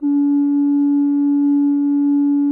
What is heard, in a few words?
GEMSHORN BAS; SoundBytes - Early Patches